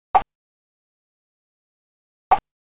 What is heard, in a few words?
Vase Hit Sound; Oretachi Game Center Zoku; Crazy Climber